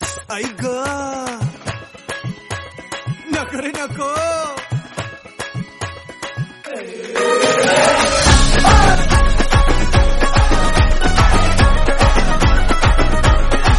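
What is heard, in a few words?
background music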